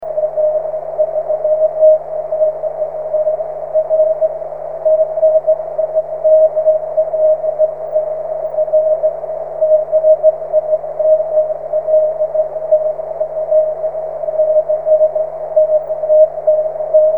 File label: 13cm cw; g3ltf